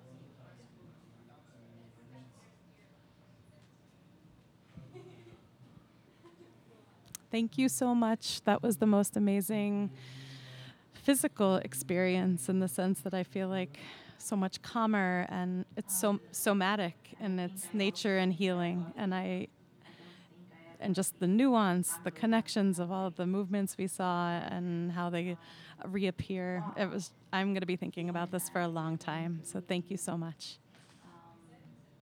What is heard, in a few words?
Audience Recording; a-meal-premiere